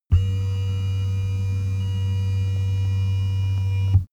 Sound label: car seat massage engine hum